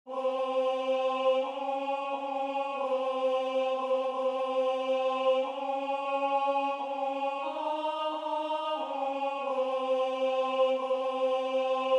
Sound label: TENOR; Alma Mater; Tenor I; West Point Alumni Glee Club; First Tenor